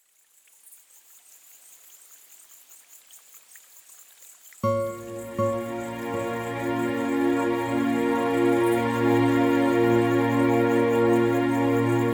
natural white noise sleep music and crickets amazon rainforest